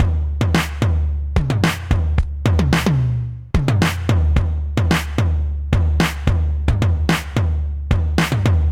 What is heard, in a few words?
DMX 110 Tom Groove, Drum Machines; Ableton